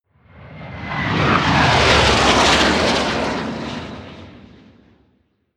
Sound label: military jet fighter flyby whoosh